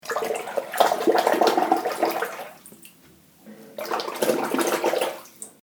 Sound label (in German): Rasierklinge im Wasser